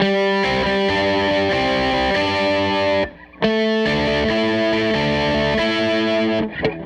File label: Strat Guitar Loop